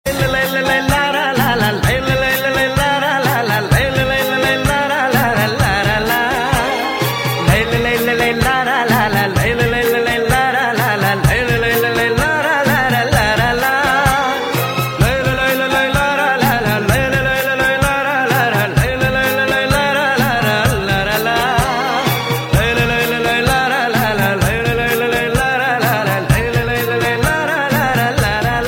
Mouth Tone

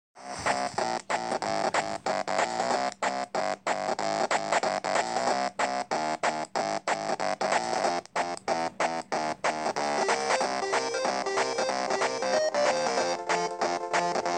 Smashing windshields

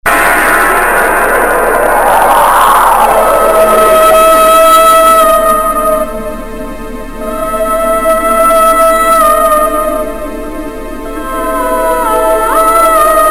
Horror

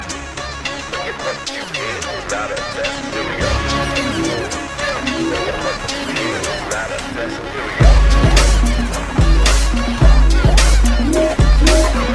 Dance Beat

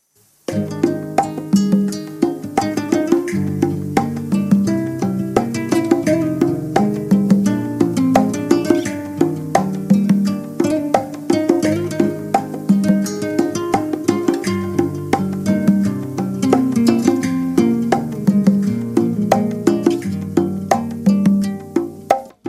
guitar arabic

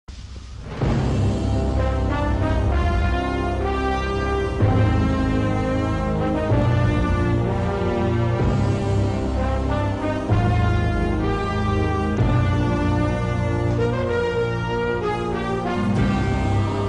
Background; Khaleja

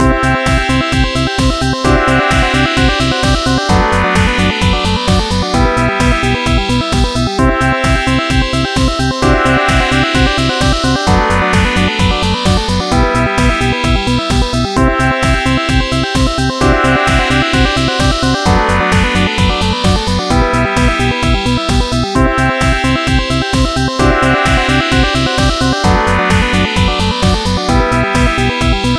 DANCE PARTY

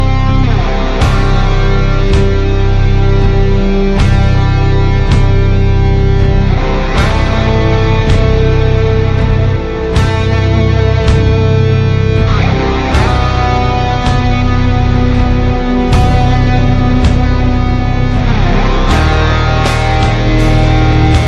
guitar cover

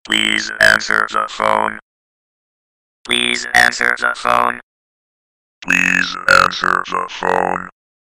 Robot Ringing

